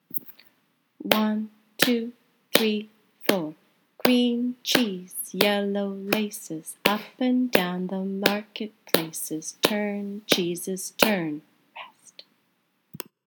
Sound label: Green cheese with beat